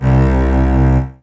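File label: E-Mu Proteus FX Contrabass C2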